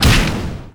Punch; ChooChoo Heroes

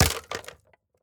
plastic break

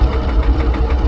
tank generic; blockade classic

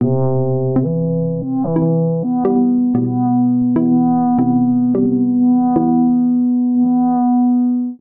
loop lead oboe; Vilmonic

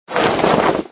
Cape Sound

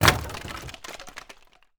wood break

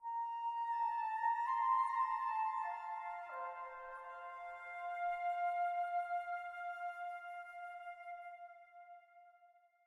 Quite noble festivities

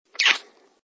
Kiss

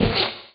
Splat; Talking Tom Cat

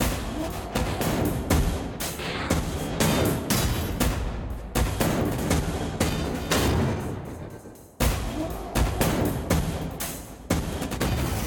Tension Fight Beat

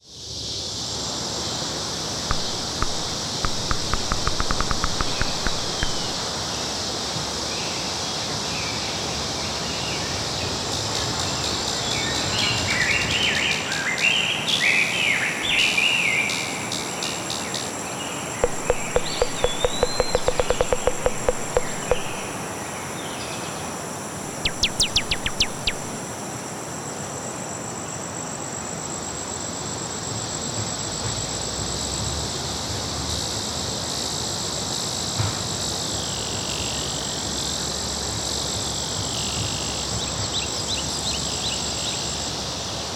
Forest Ambience; Tumbleseed

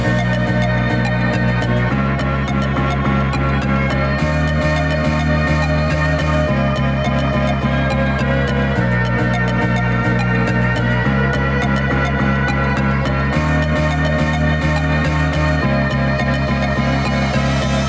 Final shot BGM; Popslinger

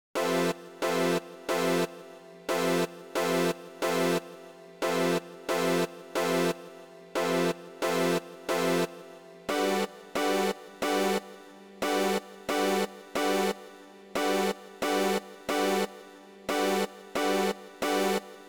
Poly Stacks; super crush k.o.

